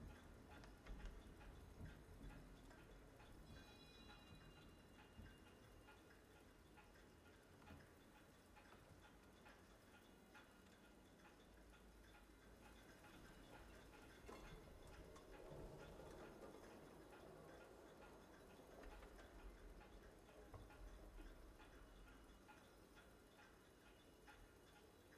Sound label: amb collection; jayce